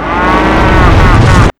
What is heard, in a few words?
axe charge windup; weapons; tf2